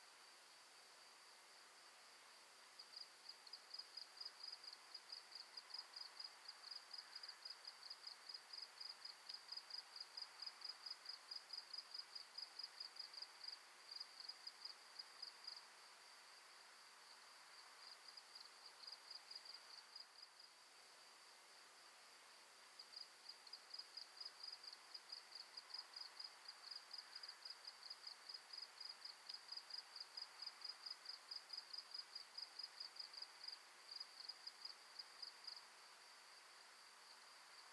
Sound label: ambient Outside Crickets Night; Zomboid 41.78.16; Project Zomboid